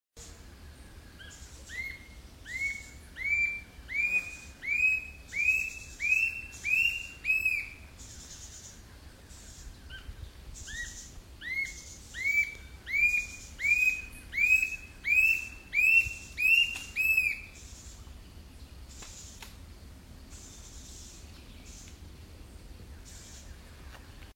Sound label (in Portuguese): Canto do capitao de saira